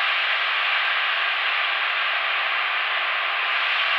sparse soundscape samples; ReLooped

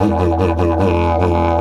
E 150, DIDGE RIFFS

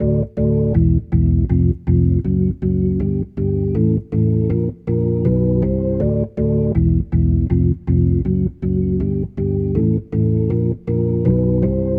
Rotor Riff, Organ

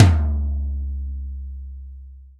tom, Real Drum Kit, funk kit; Best Service ProSamples vol.43 - Real Drum Kits

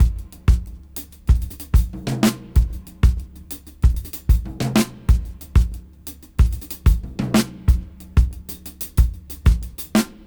Ragga 93 BPM; AMG - Keith LeBlanc Phat Butt Jams

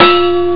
bump; ST-XX Amiga Soundtracker Sample Packs

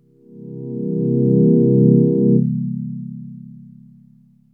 voice, dx7

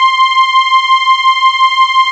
SPACE STRING, PAD; S.PAD

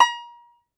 Tenor Banjo, Plucked Strings

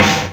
Drumulator Snare, EMU Drumulator drum machine; 300 Drum Machines

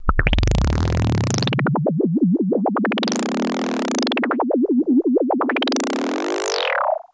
el blurps, special FX twisted; USB Soundscan; AKAI

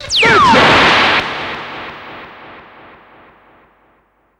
FX, Drum & Bass

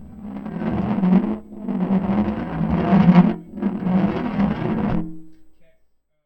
Baking Tray, contact mic, Metalics

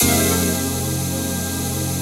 ATMO PAD, Dance Mega Jungle Rave; Best Service

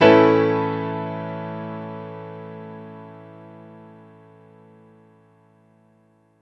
Piano stack (A) maj; Sonic Foundry (Sony Creative Software); Ugly Remnants VOL-2

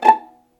violin; ILIO; Synclavier Strings

